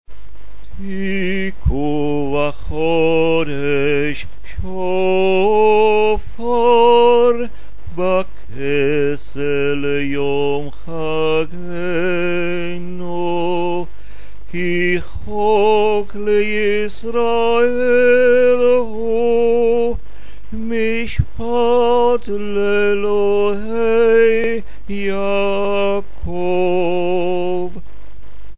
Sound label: Tiku vachodesh shofar; rosh hashana services; arvit